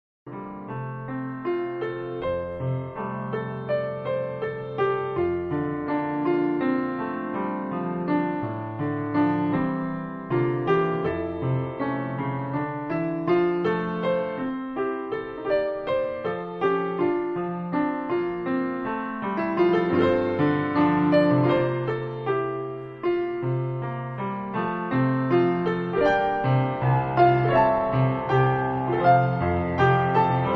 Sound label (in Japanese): ピアノ